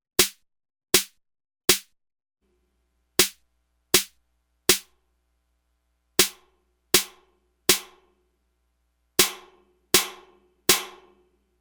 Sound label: 808 snare